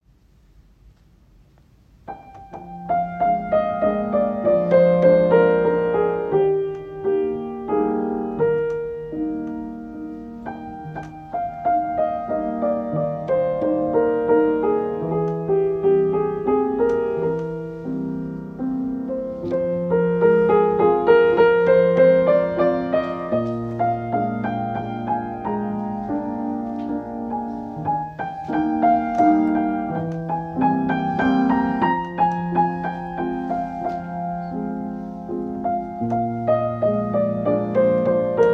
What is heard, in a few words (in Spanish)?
Musica Adagio Grupo